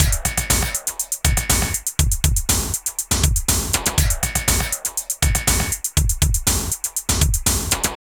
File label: Drum Loops